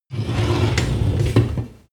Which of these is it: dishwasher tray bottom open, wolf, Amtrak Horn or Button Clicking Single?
dishwasher tray bottom open